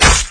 gib drop; guns n zombies 2014 pc

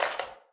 TAPE STOP; don t be afraid the first toy

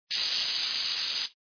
fuse loop; Super Mario 63

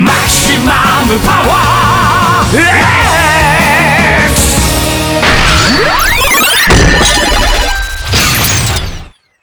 Change Music; Kamen Rider Climax Fighters; ps4

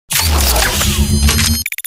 Eradicator Charge

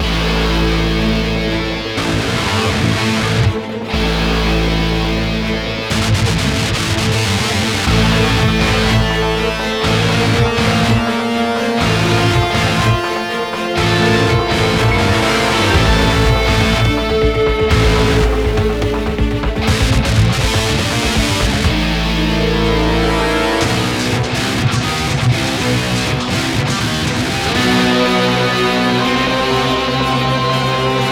High Combo; Military Base

